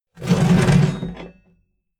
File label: metal scrape big phase